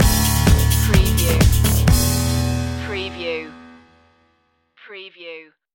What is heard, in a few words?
surf stinger